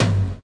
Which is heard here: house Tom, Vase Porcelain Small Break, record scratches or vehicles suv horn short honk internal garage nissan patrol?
house Tom